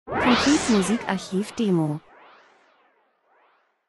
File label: transition; Transition & Movement - dunkel fliessend Zischen